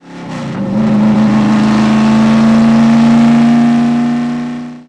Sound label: gang turbo